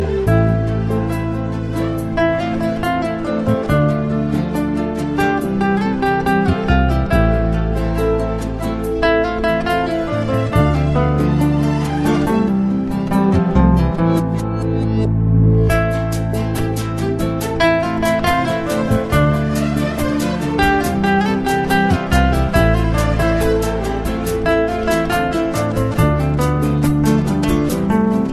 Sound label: Relaxing Love